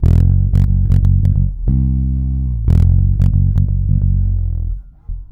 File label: F#, bass; Spectrasonics; Bass Legends; 90 S.BRONX